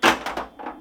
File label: bingo ball; RS Games Sounds